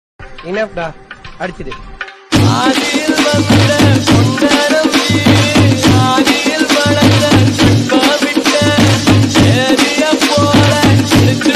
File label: dj remix song tamil